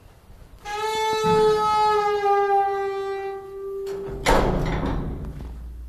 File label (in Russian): jutkiy skrip dveri podezda